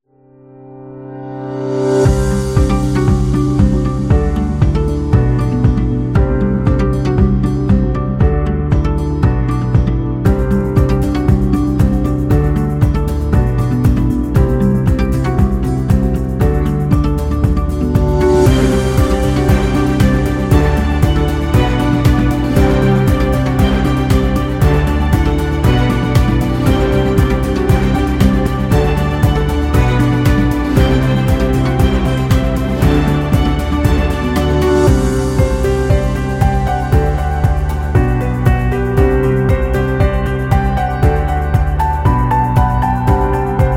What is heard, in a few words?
Happy Pop Folk Acoustic Guitar